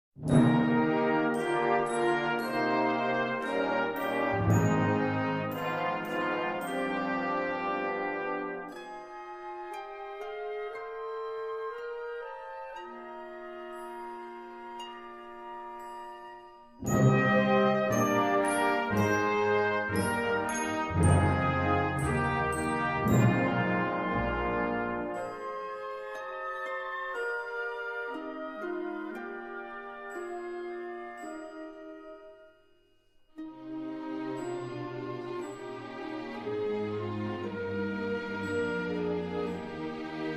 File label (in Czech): Hymna Nesejska